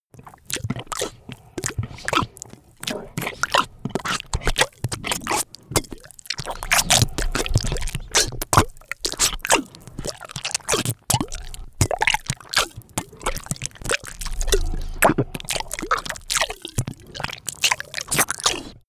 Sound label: monster flesh eating; comedy-cartoon; soundpool